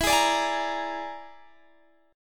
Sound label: Bb 7th Flat 5th E